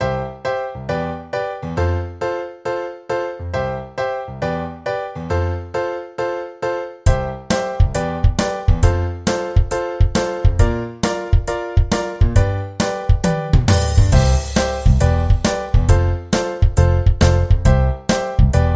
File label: Piano Hammer